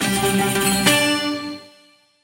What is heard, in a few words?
win excellent; medallion Desktop; Fantasma